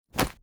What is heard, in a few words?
monk strike; Shelter